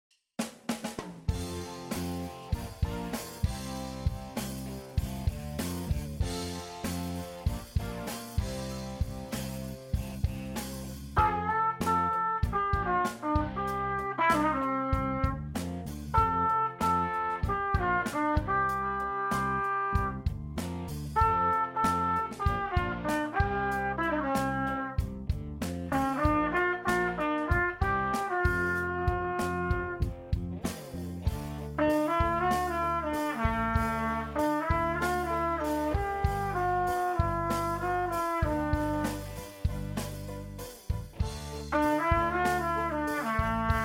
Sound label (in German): Trompete; Sommerkonzert der Mittelstufe